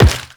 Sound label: Step Metal, Footsteps; Characters; Warframe; The New War; Kahl